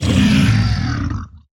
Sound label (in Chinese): 僵尸疣猪兽死亡声